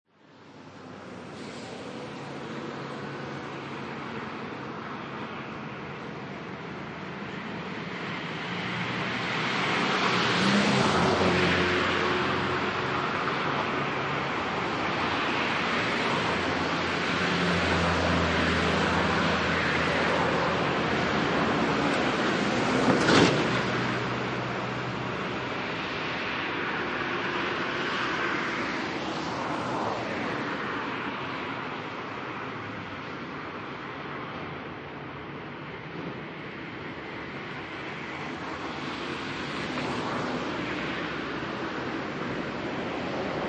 Medium Traffic Ambience, Smooth traffic moving in both direct; some cars close by and others more distant